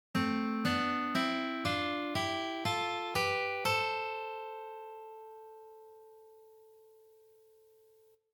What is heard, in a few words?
2nd Octave Harmony